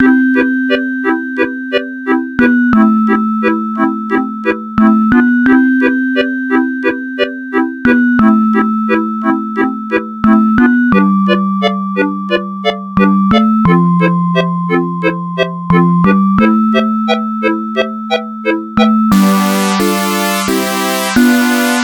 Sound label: Bonus Level